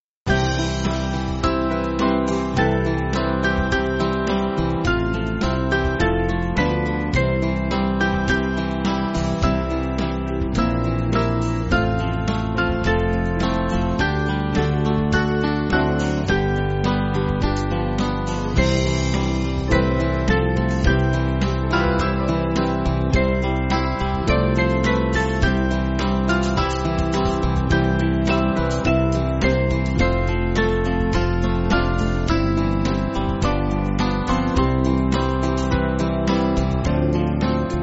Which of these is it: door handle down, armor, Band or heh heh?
Band